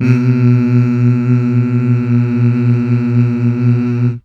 MMHH

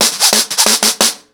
FX 90 BPM, acoustic drums; The Roots Drumloops

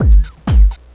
Deep Bangin, NY Dance; Sonic Foundry (Sony Creative Software); Crimson Blue and Fabulous Horncraft 4 RnB

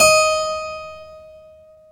KEY HRPCR, Roland; Roland - LA Composer vol. 1

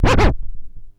ST SCRCH, SCRATCH

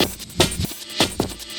LOOP, 150 BPM, Drum & Bass

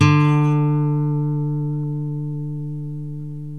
NYLON GUITAR

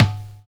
NO VERB; Univers Sons

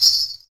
PRC METAL, Afro Shakers, Ankle Pods; Roland LCDP14 Africa VOL-2

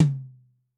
TOM ntrl M, Tom; Yamaha DTXpress-II

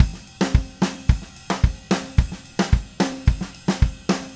Red Maple Groove 110, DW Red Maple Kit

